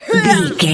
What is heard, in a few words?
voice female attack